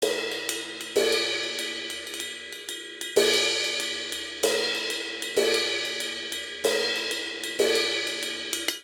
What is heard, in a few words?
Simple Seven Cymbals Only 7/8 190 bpm; Ableton; Factory Packs; Drum Booth